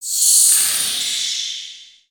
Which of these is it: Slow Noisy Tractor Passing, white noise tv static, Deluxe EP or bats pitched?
bats pitched